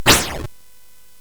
SFX; Narco Police; hvsc

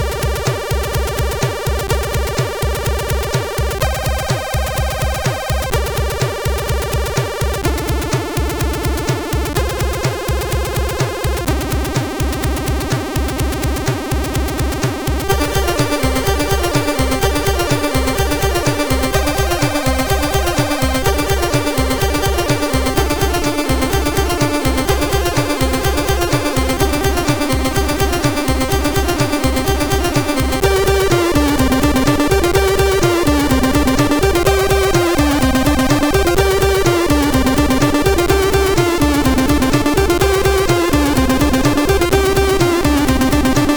Rain, CSG8580; CSG8580R5

